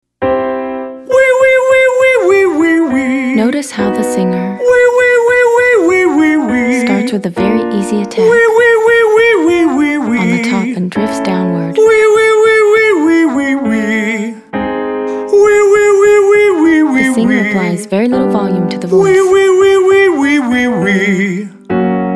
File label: Exercise oui males